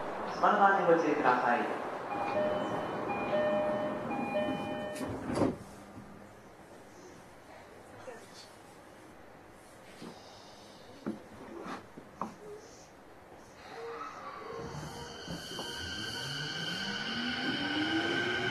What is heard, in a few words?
vvvf, mitsubishi; odakyu 4005